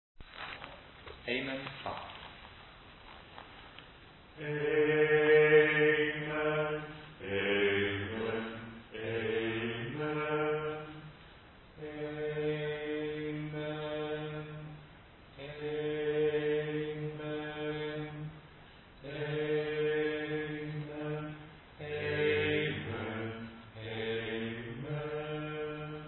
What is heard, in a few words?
Bass Amen